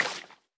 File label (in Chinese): 湿草地踩踏声